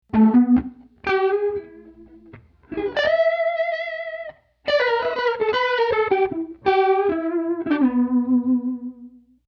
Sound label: Nice Chorus